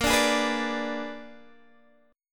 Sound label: Bb 7th Flat 9th Bb down Guitar Standard; Bb7b9 strummed; JGuitar